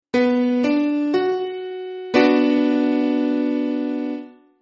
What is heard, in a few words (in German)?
H moll, konzertina; kunstklang